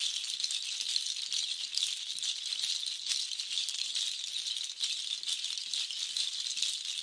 137 basket shaker, DRUM LOOPS and BREAKS

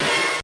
Commodore 64 SID, 8bit SID Drums; LO FI and 8 BIT KITS

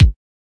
kik

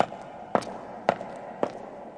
footstep reverb beat, 110 bpm, drum loop; 101 to 110 bpm

